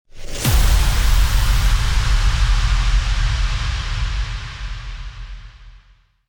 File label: IMPACT